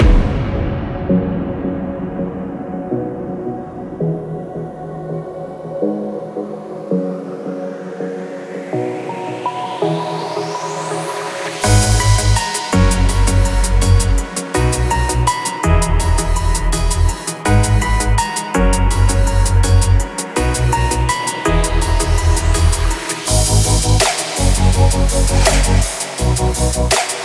Futurebass ding

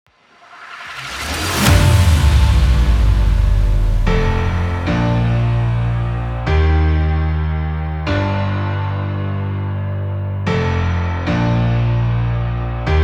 Hardstyle

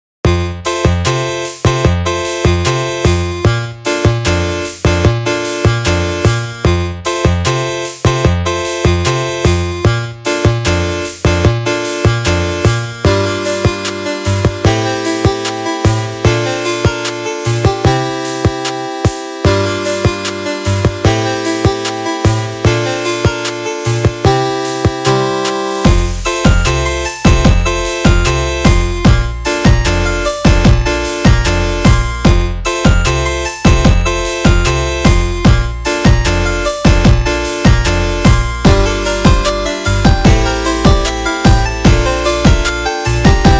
8bit nice, AI Generated

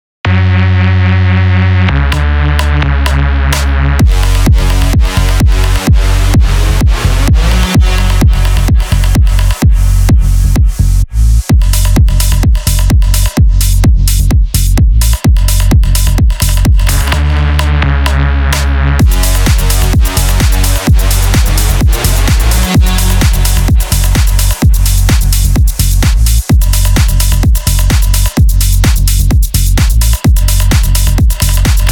deep house

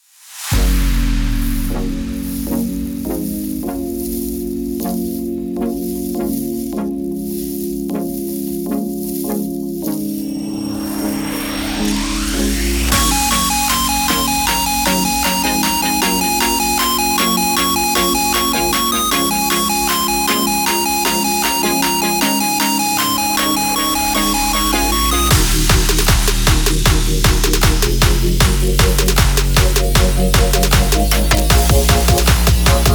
Futurebass remake

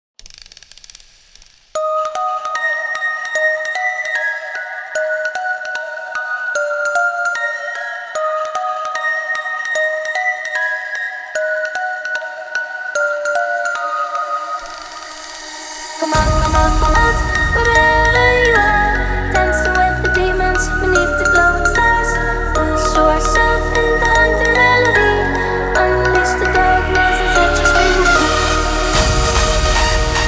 hardstyle

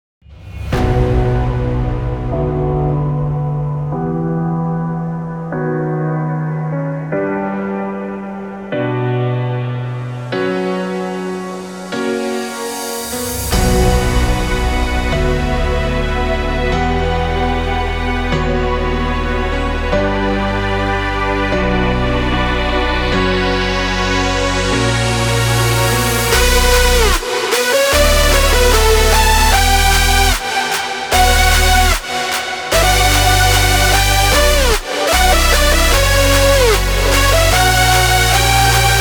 sad style

